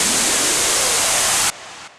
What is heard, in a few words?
Dirty Laser; Effects; Ableton; Glitch and Wash